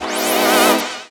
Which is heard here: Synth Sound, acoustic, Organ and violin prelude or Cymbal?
Synth Sound